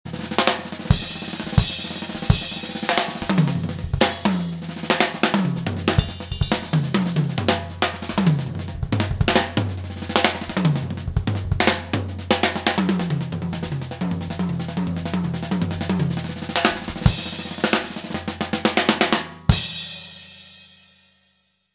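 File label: drum kit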